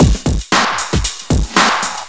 housefunk, beats; Sonic Foundry (Sony Creative Software); Ugly Remnants VOL-2

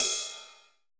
cymbal, Roland MC-909

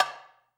DARBUKA; AKAI S-Series CD-ROM Sound Library VOL-5; AFRO.DARBUKA

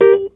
Rhodes Hit; Mo' Phatt; Digital Sound Factory Mo' Phatt

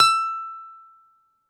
BALALAIKA, Russian Strings

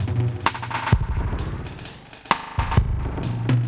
Drums A Kit Echo 130, dub drums; musicradar

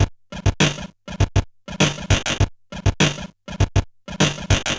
Bent RX17 (100BPM), Circuit Bent

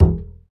acoustic bass fx; Spectrasonics; Bass Legends

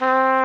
FLUGH, Orc Brass; Club-50 - Foundations Roland

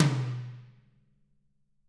Toms; Bob Clearmountain Drums I

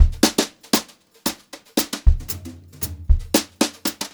Brushed Drum Loops, JAZZY, 116; USB Soundscan vol.39; AKAI; 90 s Sample CDs

